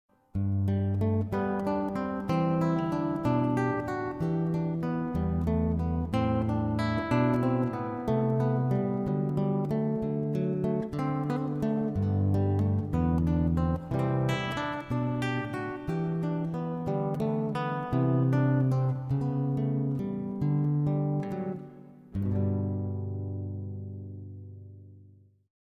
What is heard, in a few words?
Cantata 147